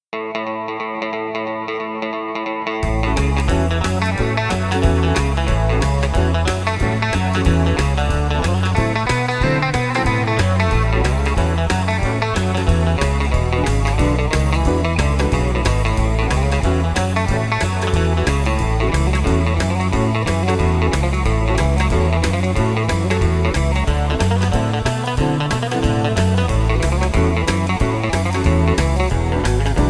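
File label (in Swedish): gitarr boogie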